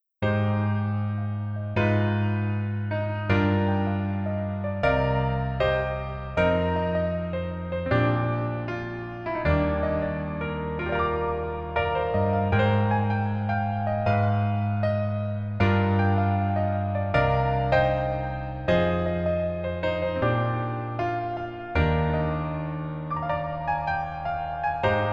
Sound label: Piano